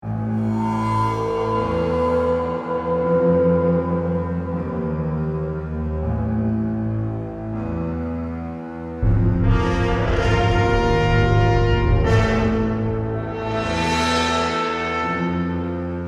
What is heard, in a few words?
Composition, Production, Element, Composition, Orchestral, Dramatic, Scary